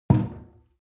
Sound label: sink pull plug no water; industrie-wirtschaft; gastronomie